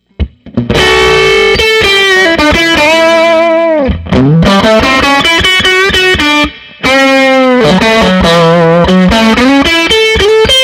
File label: firebird pickup bridge dirtiest; Jason Lollar